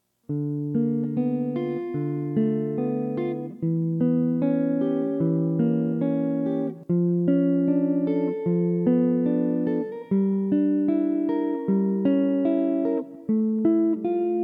Min 6th and Dim Chords borrowing on 3rd, jazz chords; Barry Harris harmonization